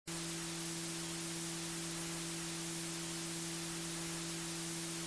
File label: rainy vacuuming; sound generators and happy little accidents